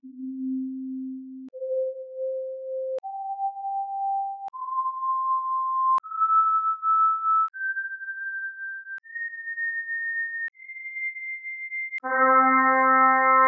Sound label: Harmonics of Trumpet Tone